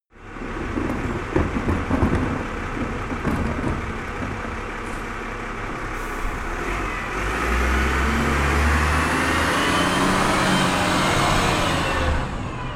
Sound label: garbage collection